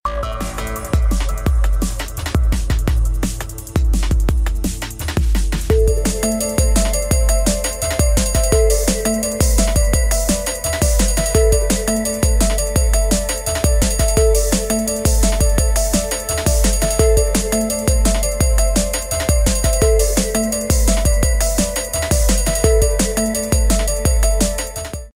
fast chillout

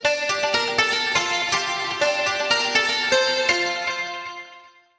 Clubs Music

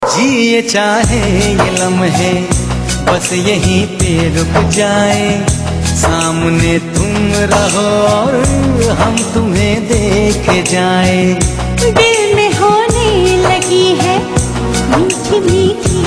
Sweet Voice

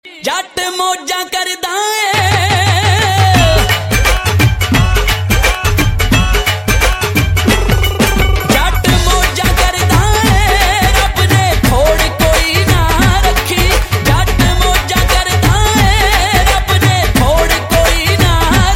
Bhangra

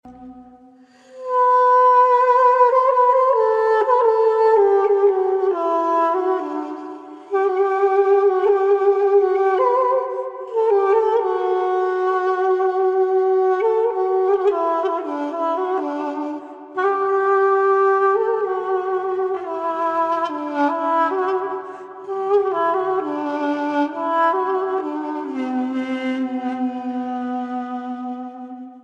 Ney Sound

